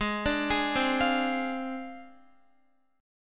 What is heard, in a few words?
Ab 6th Flat 5th, Guitar, Ab6b5 chord; Ab6b5 arpeggiated; JGuitar